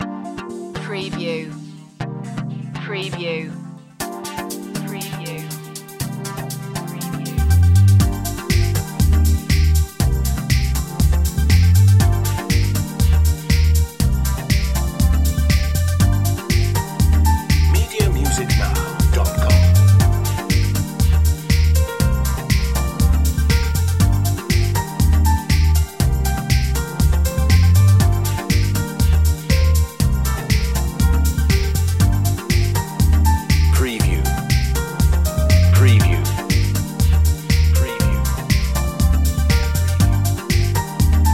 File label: trance